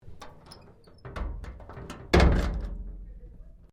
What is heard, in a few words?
DOOR INTERNAL OPEN, Sounds onboard HMAS Castlemaine; Boats; Vehicles